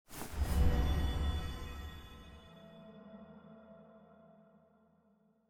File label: Bells; cutscene 1-3